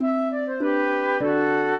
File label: trio, flute harp; Mozart